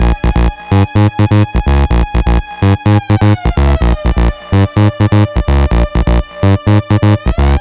126BPM Melody; VFH2 126BPM Pong Kit

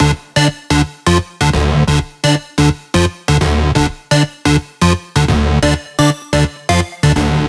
128BPM Synth Root D; Vengeance Samples; Beatdancer Kit

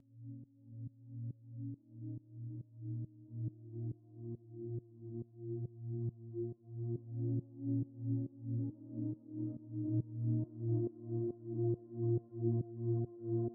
Pad 138BPM, A#minor; Unlooped; Vengeance Samples; Fusion Kit

